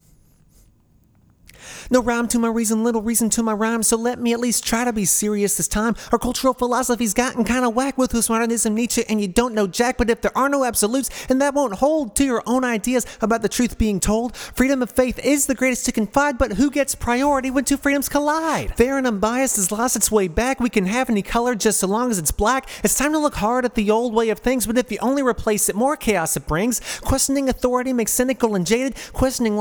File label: verse lead